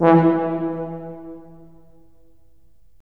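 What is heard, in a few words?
brass tuba; LA Composer vol. 3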